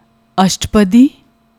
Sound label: Ashtpadi, singing styles of Gurmat Sangeet